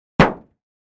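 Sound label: Car Hood Hit Sledge Hammer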